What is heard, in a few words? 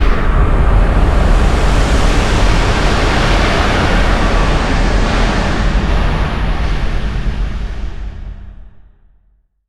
cryo ice raise mono; Quake 4 Alpha; id software